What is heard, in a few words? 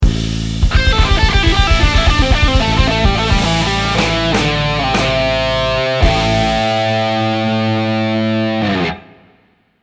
ending phrase, guitar